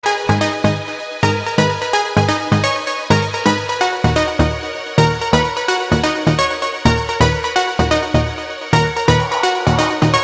Electronica

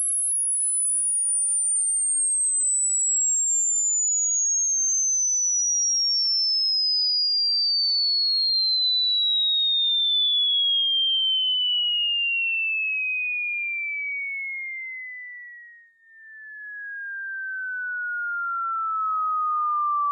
Ouch

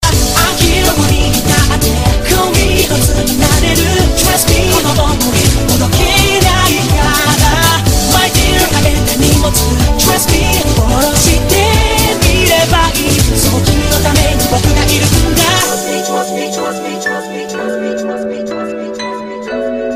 Anime Song

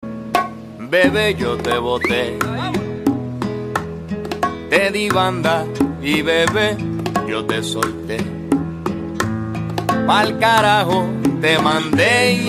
bolero

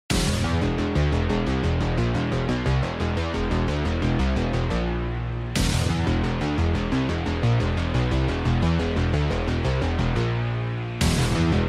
synthwave; Doom

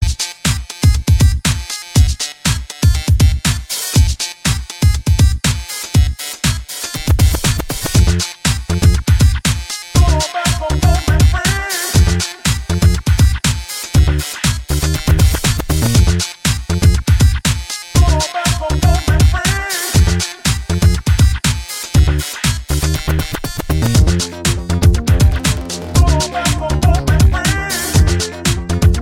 Acid Break

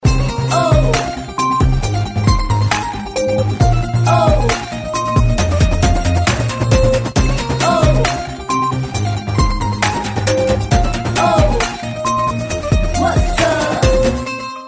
Rock

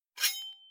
sword; Warrior

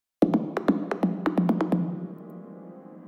Horror Ringtone

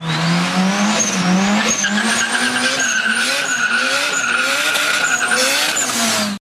B18 Turbo Burnout

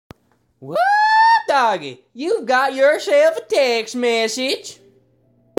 Woo doggy

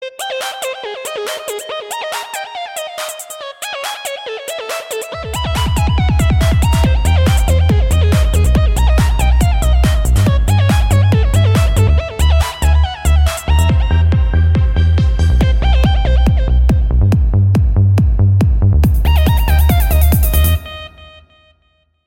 Trance

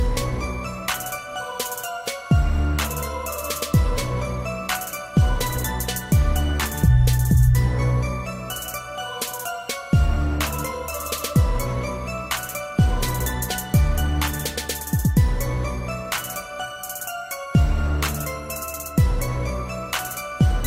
Christmas Holiday

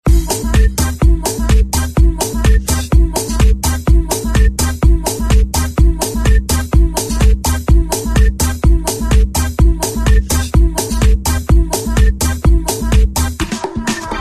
Game Over

